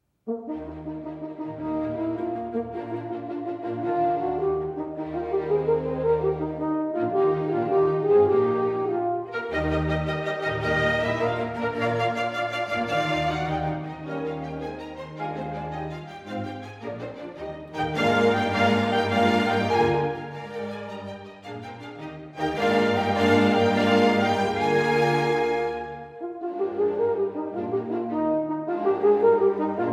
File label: Horn Concerto, Allegro; Mozart